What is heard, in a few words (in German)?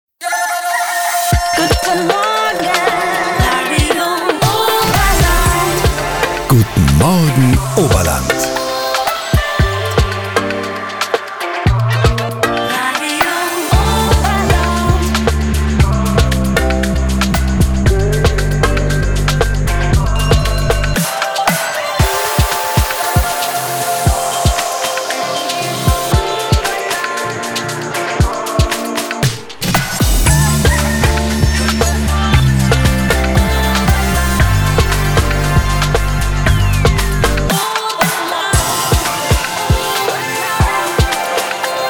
Show Opener Morgen; RADIO OBERLAND